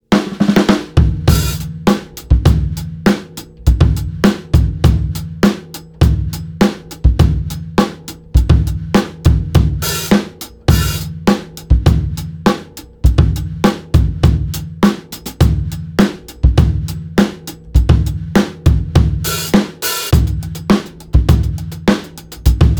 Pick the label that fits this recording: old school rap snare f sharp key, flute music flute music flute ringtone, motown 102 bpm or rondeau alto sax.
motown 102 bpm